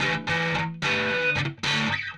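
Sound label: Distortion Riff Guitar; Guitars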